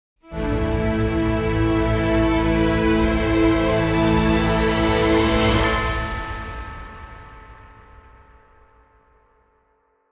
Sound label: Dramatic Sting